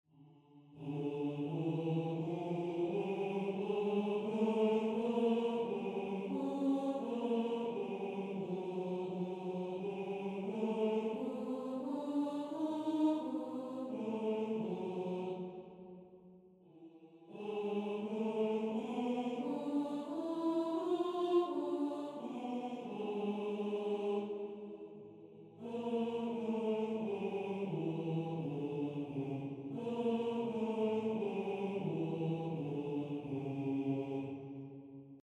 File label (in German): Tenor